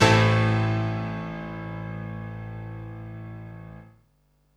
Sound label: Piano Bass; Snake; Feel